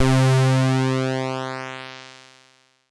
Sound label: bass